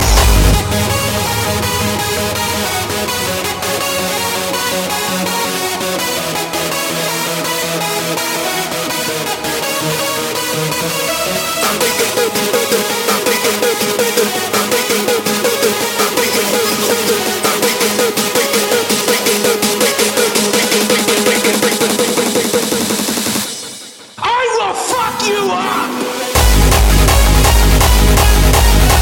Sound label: Melodic